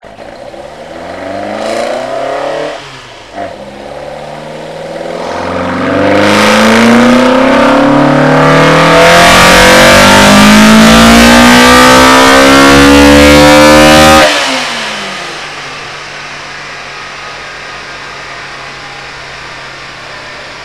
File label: opel 400 motor